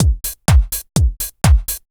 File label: HOUSE BEATS 125